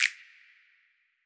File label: Snap Group; Kagi; M8; percussion and foley